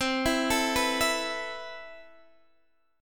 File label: A Suspended 2nd C; arpeggiated